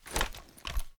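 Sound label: raise movement; gmod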